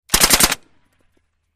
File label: Metallic Silenced, silenced